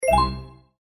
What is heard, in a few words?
button; Chhota Bheem - Jewel Quest